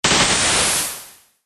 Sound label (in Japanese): ダッシュ